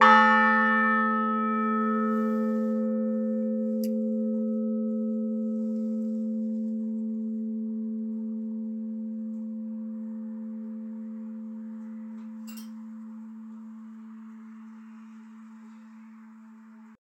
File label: old eight, bells; brighton